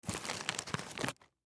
Plastic Bag Junk, Plastic Bag Junk Food Large Chips Reach In Grab Chip Pull Out; Foley; SoundEffects-Blastwave